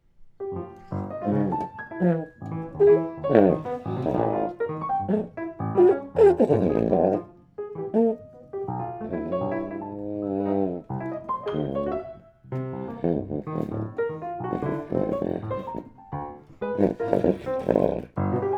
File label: prelude tbn pno